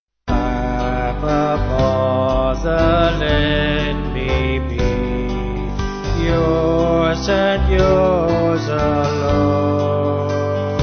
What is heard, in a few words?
Vocals Band